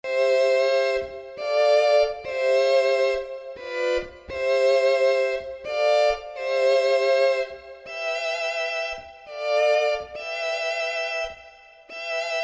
strings

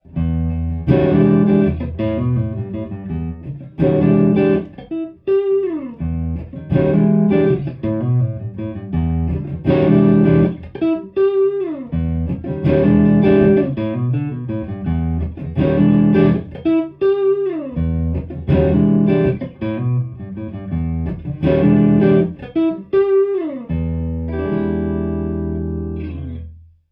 Vortex Mic, amp samples

